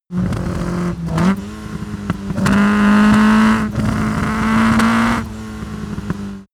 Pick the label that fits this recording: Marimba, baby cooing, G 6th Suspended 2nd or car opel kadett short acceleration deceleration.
car opel kadett short acceleration deceleration